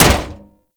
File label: Staff Impact Armour; Warframe; Weapons; Impacts